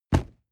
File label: nylon leather bodydrop hard